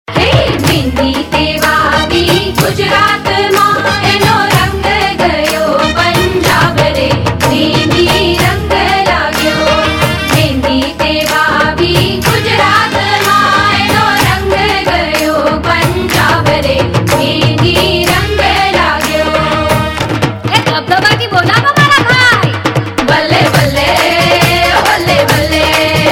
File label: Punjabi Gujarati Sagai Song Gujarati Ringtone; Bollywood; Patel Ki Punjabi Shaadi